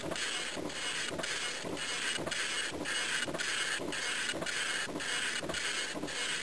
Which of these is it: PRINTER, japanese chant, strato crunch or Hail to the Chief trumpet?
PRINTER